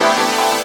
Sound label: Synth Shots; Vengeance Samples